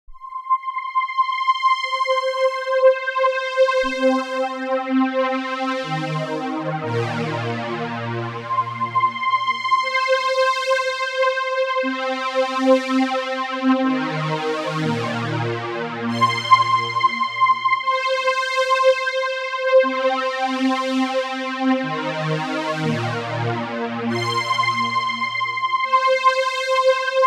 M1000 BIG Pad STRINGS INT FX

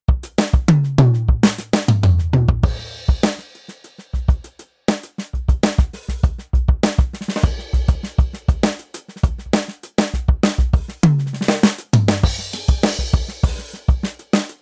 Rock Kit Direct

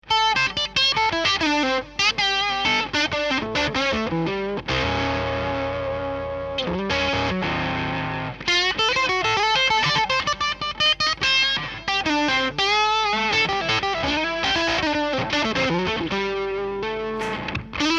octave fuzz

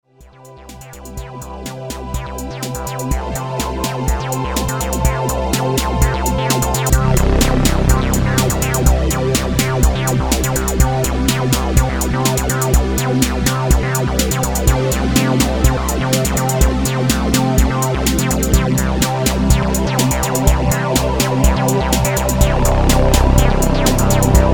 vp + ju60 + taurus + 606

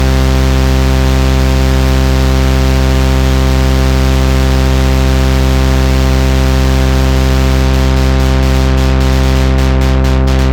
Erica Synths DB 01 Jam

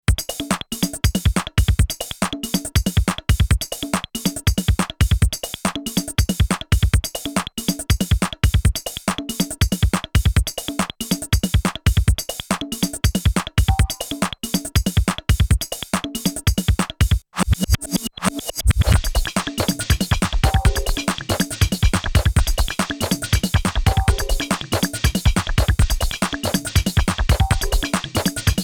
Roland TR 8S Pattern

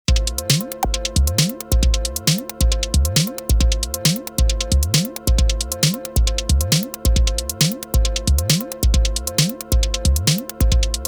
LFO To Pitch

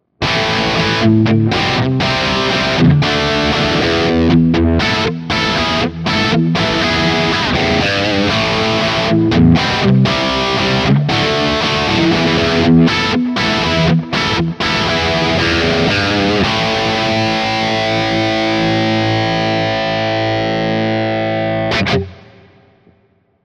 EMG T System High Gain Bridge

